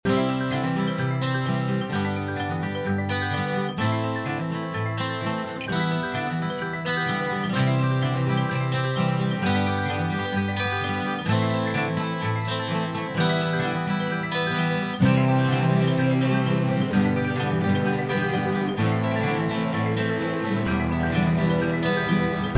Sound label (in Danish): impro a mol pentaton